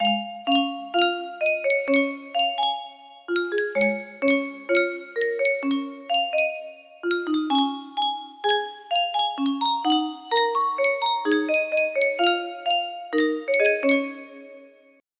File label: music box, unknown tune